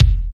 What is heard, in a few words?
KICK, Tekno Industrial; East West